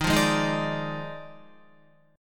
Eb Major 7th Suspended 2nd Suspended 4th Eb Guitar 4F 4A 5C 5G 5C; strummed; 10,8,8,8,8,10; EbM7sus2sus4